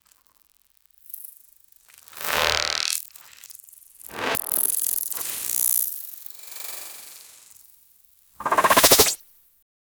swoop slime stretching

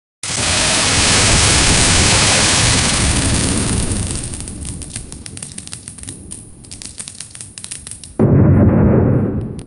katana slash sound with lightning

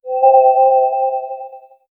a level complete angelic

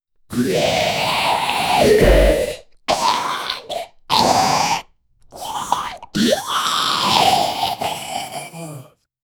grotesque zombie snarls

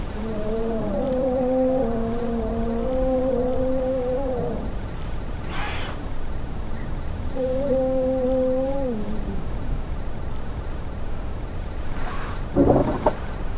from inside the tent, camp

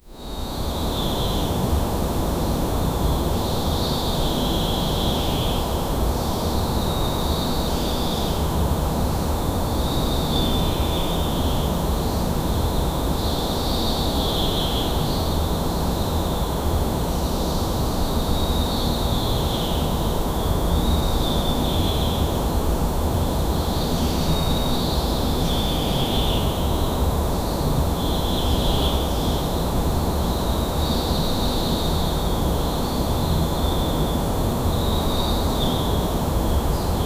a minimal air flowing

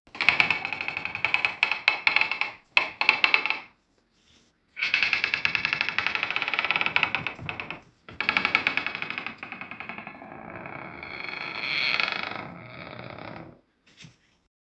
a slow creepy scratching

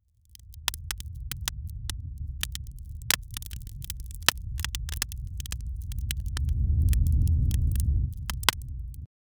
transition fireplac; asmr; sound transition fireplac

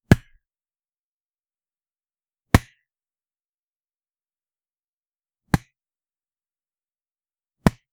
bright playful sound of a, golf

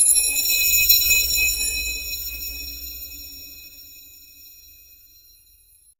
chilly echoing reverb acr; mirror

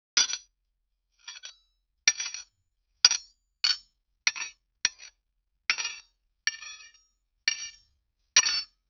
asmr metal cutting; artificial intelligence

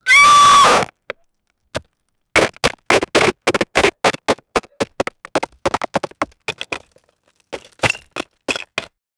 fists pounding; icemothers

